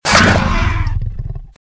Velociraptor - Attack; RadwinIsland

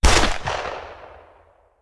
Hand Gun; Sound Ideas; Guns

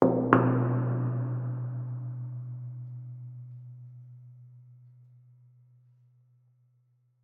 Semi Truck Tanker; Large Vehicles; Blastwave

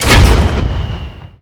Grenade Launcher Shot; SFX Kit; Weapons

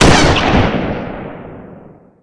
Gun Sniper Rifle Shot; SFX Kit; Weapons

